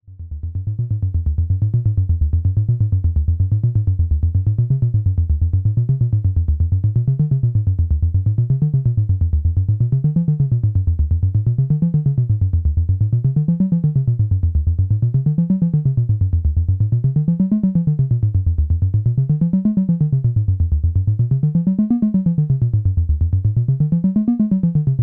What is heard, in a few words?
reverse at step, analogsynth, SEQ16